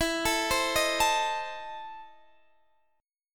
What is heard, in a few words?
E Major 7th Suspended 4th Sharp 5th, Guitar, Open D